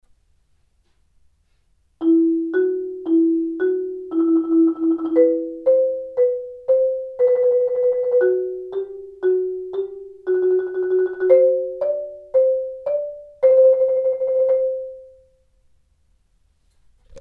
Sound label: mallets